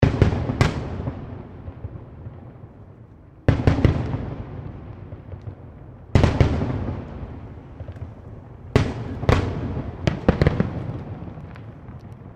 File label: Fireworks Small Display Explosions; CraftedSignals